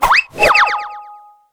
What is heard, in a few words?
Character Attack